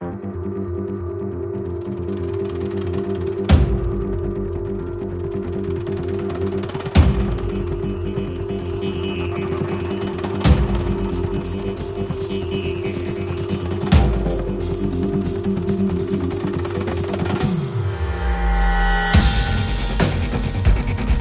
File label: Drum Driving, Full With Small Perc